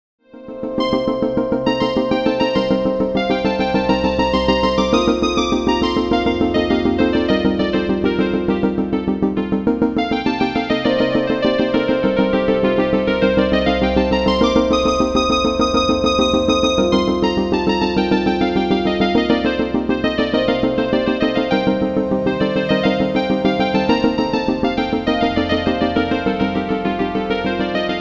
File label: OP-1 Sample